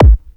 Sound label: KICK L; KICKS; AKAI; USB Soundscan vol.20 - Fresh Disco House I